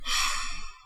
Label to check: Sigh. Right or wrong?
Right